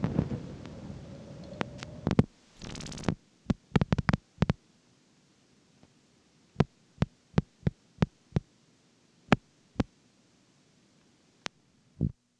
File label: blips and clicks